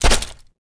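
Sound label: droid large fall Dirt; droids robots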